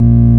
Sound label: ORG VOX A#, Continental; Roland LCDP09 Keys of the 60s and 70s 1